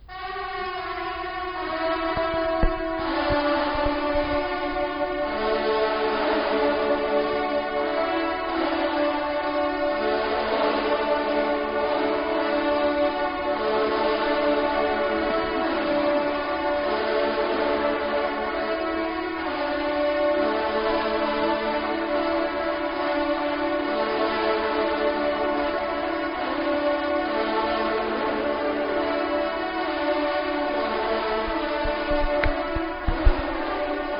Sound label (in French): carillon; senlis